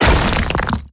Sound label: semblance